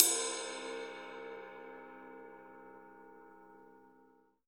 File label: Drums Acoustic, RAW KIT; USB Soundscan; AKAI